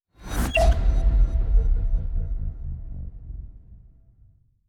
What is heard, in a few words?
notification destiny trait; notifications; Stellaris; paragon